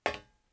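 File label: metal walk left, footsteps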